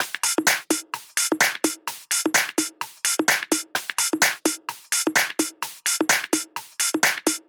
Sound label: Shuffle Top Drum Loop, 128 BPM; Drums; Loops; House